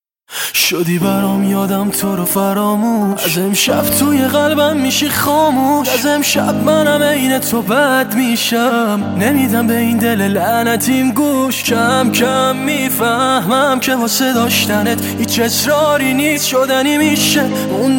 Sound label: Piano Version